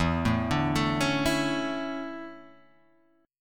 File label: D Major 7th Suspended 2nd